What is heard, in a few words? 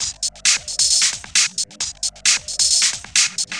radio loop; Ballist; Whipburger